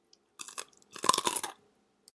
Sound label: Carrot Crunch